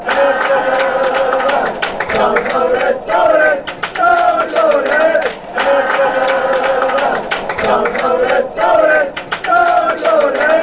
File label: barmy army, fanchants; brfc